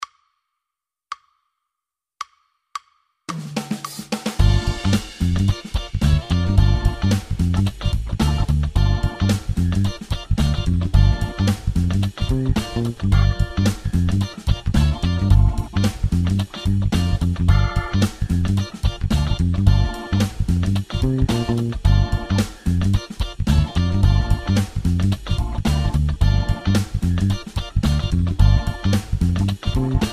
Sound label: Base Funk Gm bpm 110